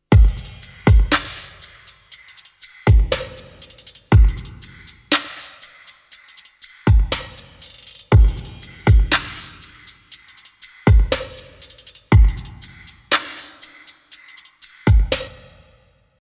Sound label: Drumloop Flanger Plate; DRP-20